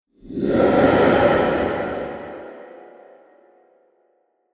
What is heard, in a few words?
Monster Noise; horror; fantasy sound effect; Andrew Fleming